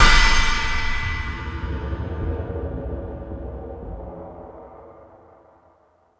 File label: Metal Drums, E-MU Pro-Cussion; drum machine